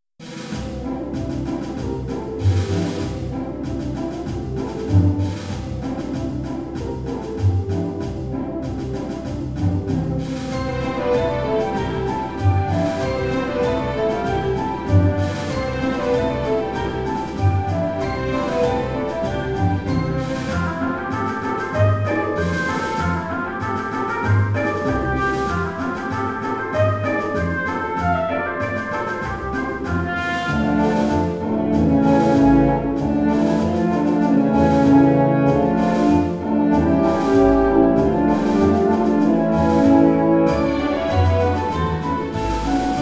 Whimsical

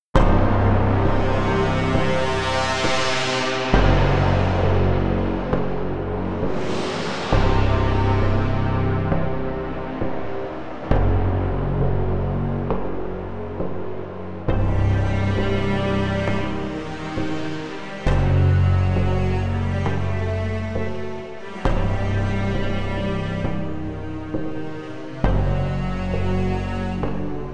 Dark Electric

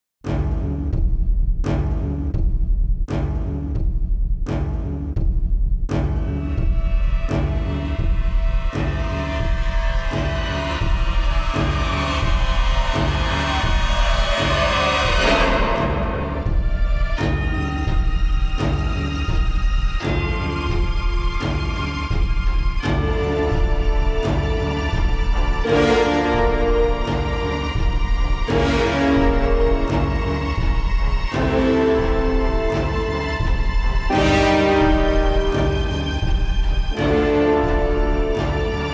Disturbing

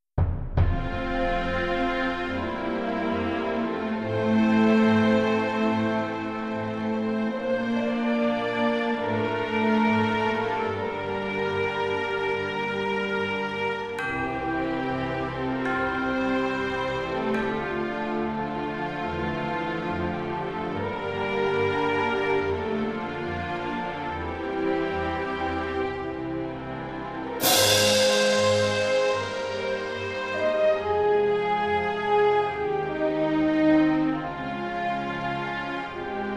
Film Opening Cue

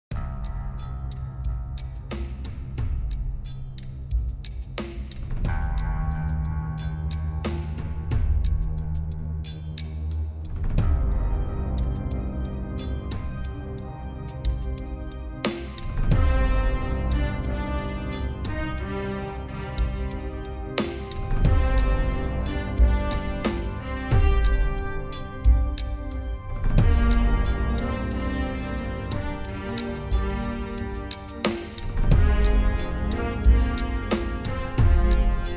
Boss Battle

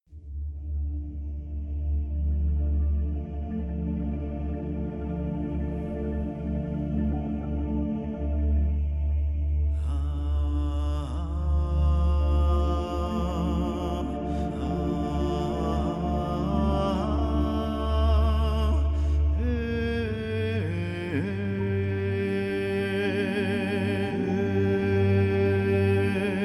Ethereal

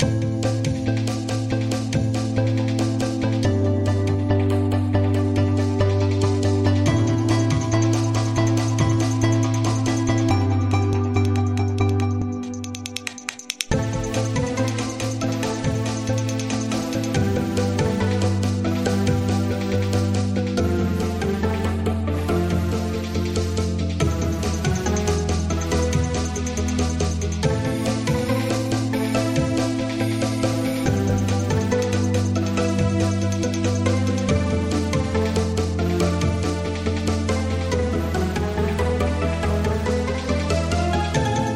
Loop; The Sand Knight

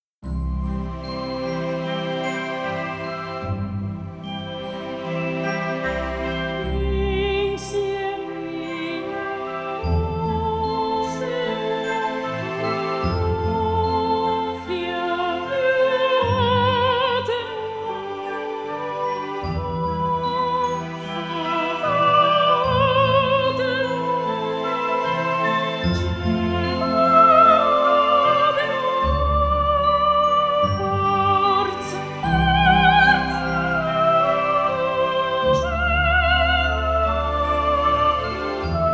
soprano

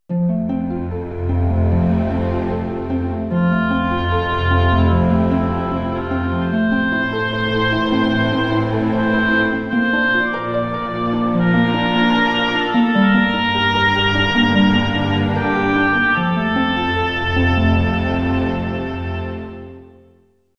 Music Cue

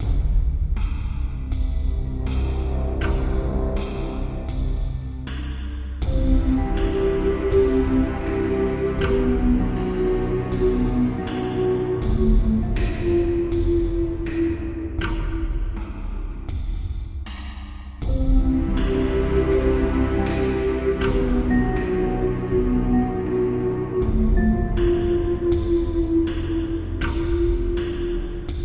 dark synth

